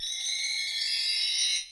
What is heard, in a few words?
Wind Chimes